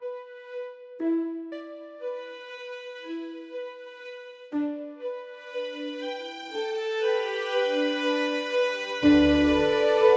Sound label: magical orchestral score, adventurous